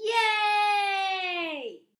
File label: 1 person cheering